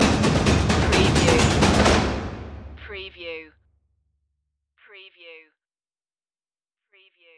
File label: trailer percussion stinger